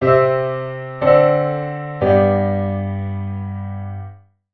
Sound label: Half Cadence